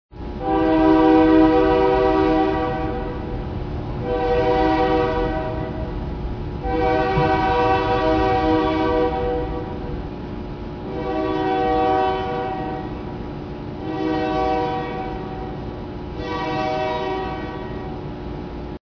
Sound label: Train horn